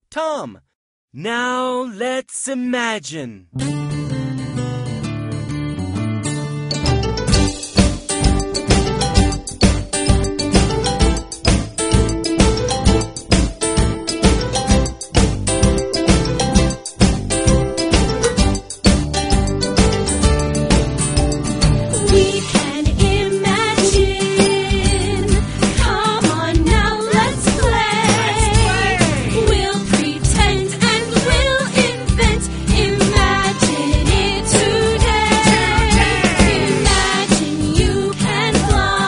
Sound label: tom